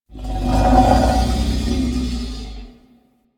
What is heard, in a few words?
Wolf Ambient Call; Animals; DE; Warframe; Quests; Duviri